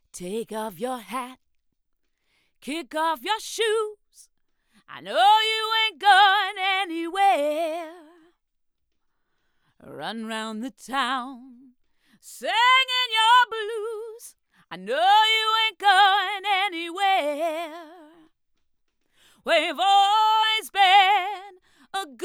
Vox; Daking

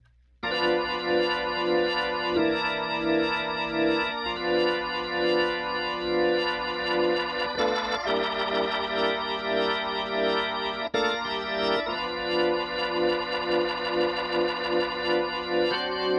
Organ Fet II Compex; Daking

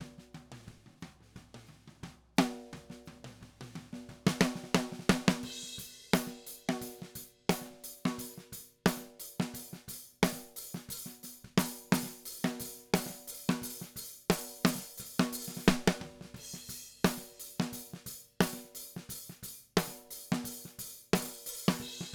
EQ Snare

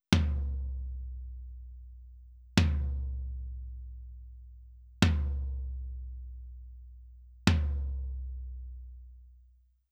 Daking Tom 1 Fet II Fairchild

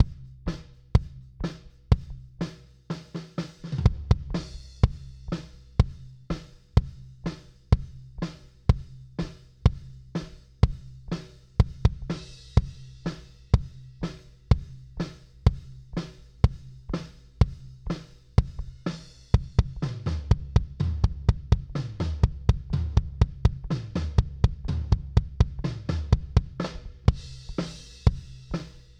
CL-1B Kick; TubeTech

